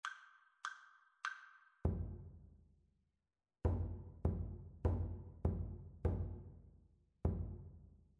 rhythmic dictation

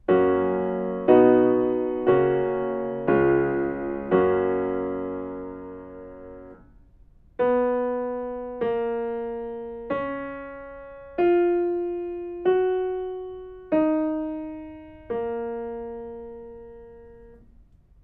melodic fragments

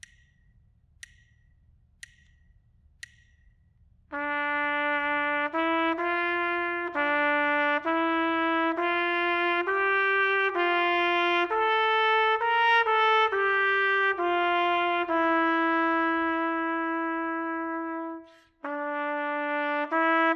melodic dictation; ear training

